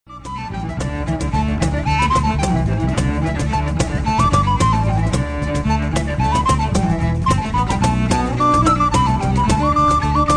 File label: Cello